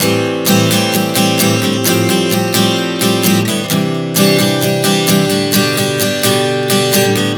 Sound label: Am7-Fadd9-Cadd9-G 130 bpm, acoustic guitar chord progressions